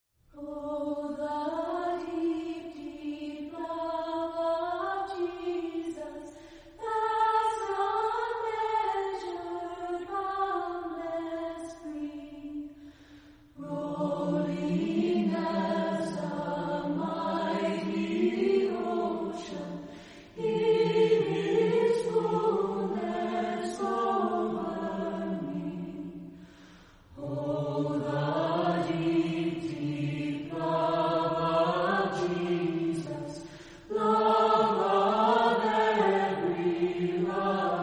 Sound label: Chorale, Voices in Praise acappella